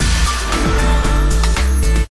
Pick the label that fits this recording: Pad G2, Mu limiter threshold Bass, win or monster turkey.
win